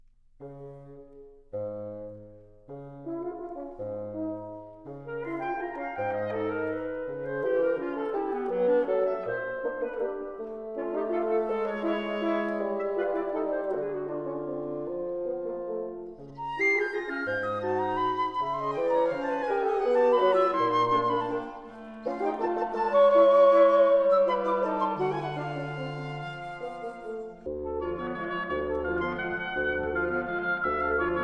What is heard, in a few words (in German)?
Kleine Kammermusik fur funf Blaser